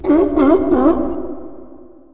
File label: SEAL; EARTH